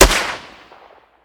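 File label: weapon dmr sup fire player atmo ext; gmod; viper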